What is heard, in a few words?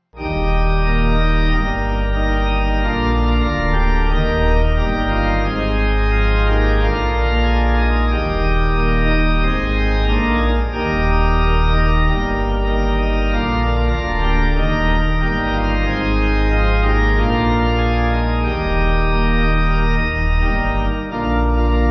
Pipe